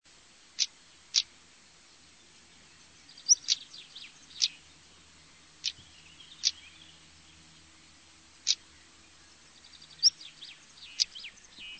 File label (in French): tarier pâtre, chants